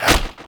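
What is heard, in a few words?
axe hit thrown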